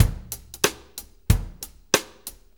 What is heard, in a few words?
Country, Drum Loops